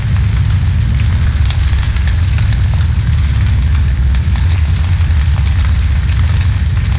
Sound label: fire large